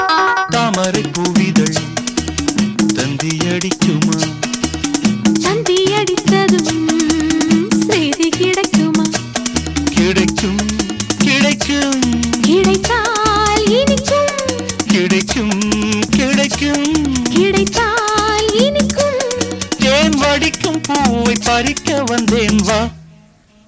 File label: song tamil ringtone